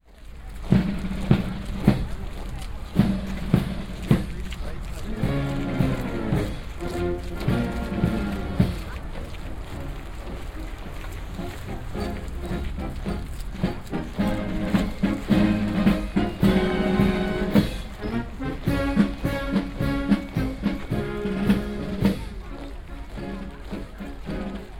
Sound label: orchestra; stockholm